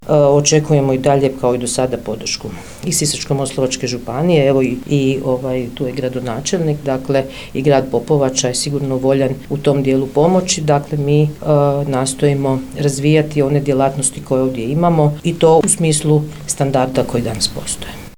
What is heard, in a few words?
POP